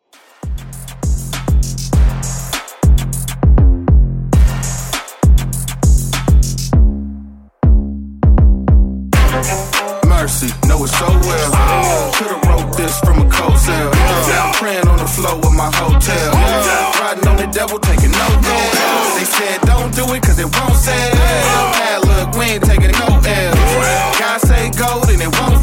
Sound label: Hardest Redrum 100Bpm Dirty